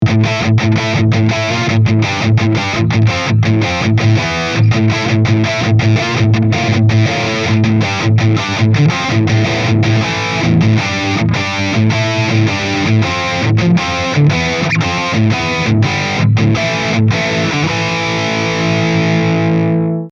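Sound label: ESP LTD Vulture riff bridge pickup; james hetfield signature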